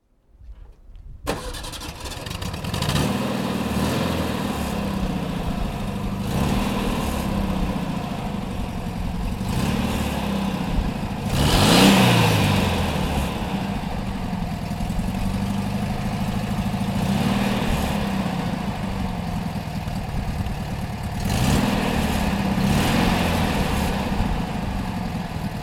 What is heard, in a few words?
VW Pritsche Typ 2 T1